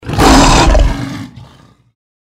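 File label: deathclaw power attack; npc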